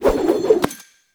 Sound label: Speargun Thrown Reload Whoosh; Warframe; Weapons